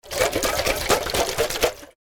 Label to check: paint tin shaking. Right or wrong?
Right